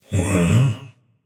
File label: confused; chaac